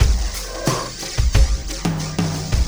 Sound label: Sky Lab Beat, Processed Drumkits